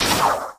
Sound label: player swing; spider-man-mysterio-rush-game